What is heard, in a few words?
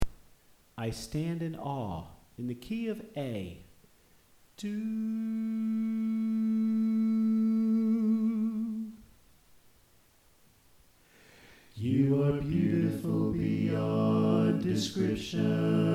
Tenor Left